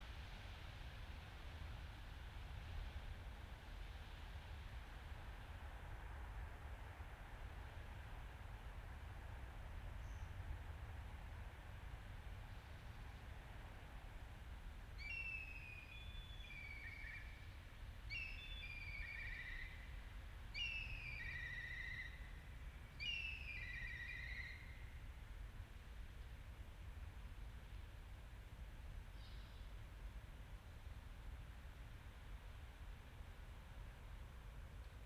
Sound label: yamanakako sound